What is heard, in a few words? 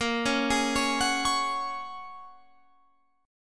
Bb Minor 7th Sharp 5th, Guitar, Open F; Bbm7#5 arpeggiated